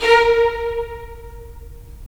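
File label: strings violins, left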